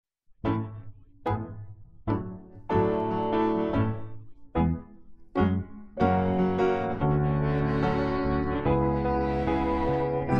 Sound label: instrumental